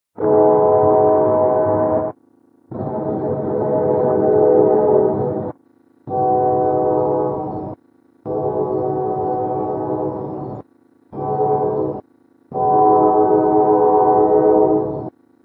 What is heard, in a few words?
whistle train; trains